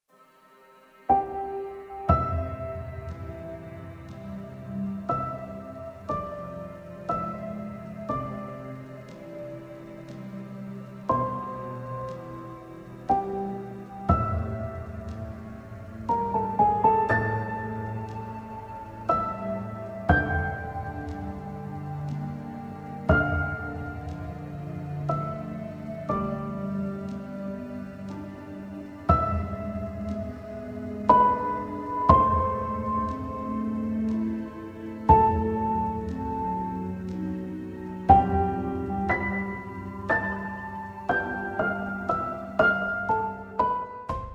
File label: Nocturne in E-Flat Major